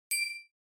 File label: Bell Accent, High Dry